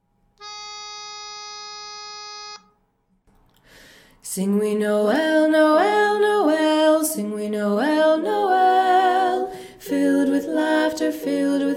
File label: Lead Dom; SingWeNoel